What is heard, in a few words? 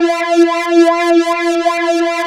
Korg PS3100 E 5; Back In Time Records; Korg Universe VOL-1